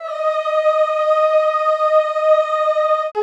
CHO, Roland; Sound & Vision; Gigapack I CD 2; CHO Zzzz + Vvvv; CHO Vvvvv

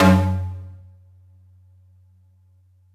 attack F#1, Yamaha VP-1; Music Store; VP-ATTACK

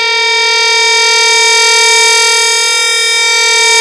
2600 G5, ARP 2600; Hollywood Edge - Giorgio Moroder Rare Synthesizer Collection

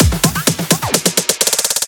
Fills 128BPM, Clubsounds

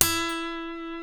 F#, funk guitars; Spectrasonics; Hans Zimmer Guitars VOL-1; TAKA FUNK

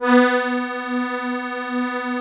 STEIRI B 0, STEIRISCHE

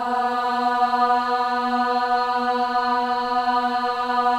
WOMEN AHS B3; Spectrasonics; Symphony of Voices

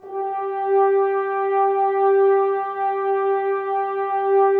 Brass F.Hrns; Sample CDs; Miroslav Vitous; Woodwind Brass Ensemble

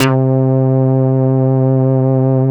HS BA, synthesizer bass; Synthesizers; Soli-Music; World of Synthesizers VOL-1

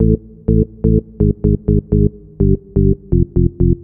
Organ Bass (125BPM), retro house

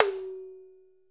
ROTOTOMS; Sonic Reality - Vintage Timetraveler

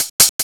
HH LOOP; USB Soundscan vol.27

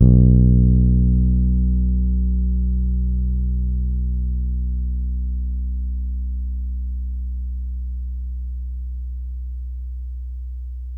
B2 hard, Bass; Pedulla Thunderbass; Giga Samples Collection

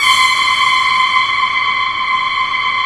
C5, Lead; Xtreme Lead-1; Digital Sound Factory Xtreme Lead

